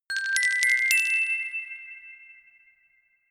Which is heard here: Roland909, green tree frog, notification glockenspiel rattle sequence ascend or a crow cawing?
notification glockenspiel rattle sequence ascend